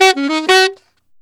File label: Alto Sax, Alto Loops, Jazz Solos and Sections, Traditional; Sonic Foundry (Sony Creative Software)